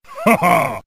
ha ha, ooh ahh

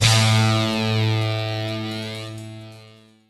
twang, stabs

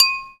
pierce, Bells; Misc Percussion

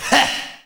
heh male, Voice; ooh ahh; heh huh

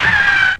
scream jazzy bell; ooh ahh

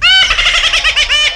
laugh cartoon

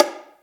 slap, Bongo Congo; Misc Percussion

